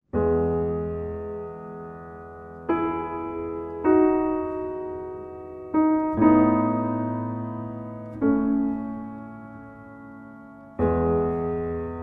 Piano and Orchestra

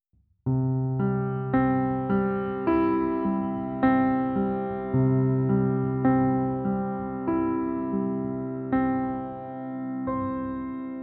Pure Piano Version

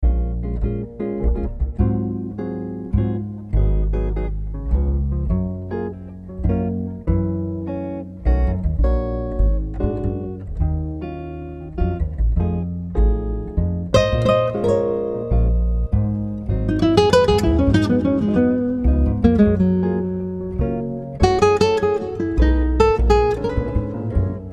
Smooth Dinner Jazz Ambiance

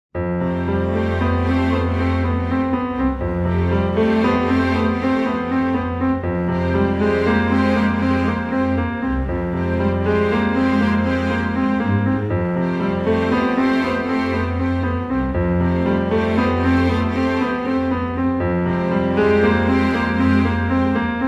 Seascape Piano and Strings

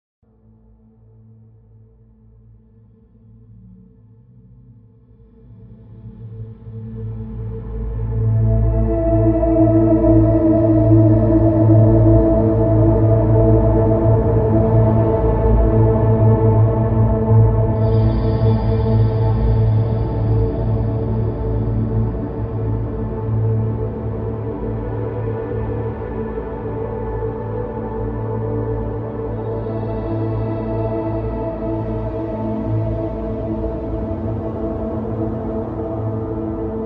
Drone

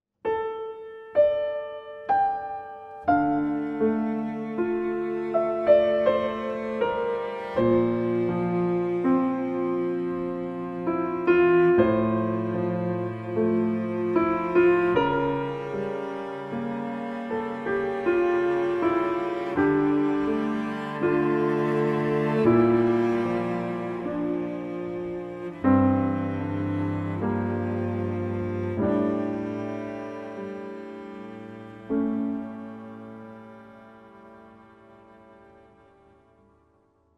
Piano and Cellos

